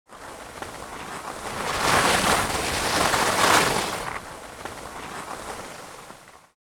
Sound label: Ski slalom down hill pass by close fast